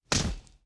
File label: impact; Power Rangers vs Teenage Mutant Ninja Turtles - Ultimate Hero Clash 2